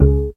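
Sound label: synth organ noise; Best Service Gigapack 1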